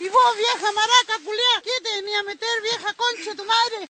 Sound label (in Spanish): Vieja Maraca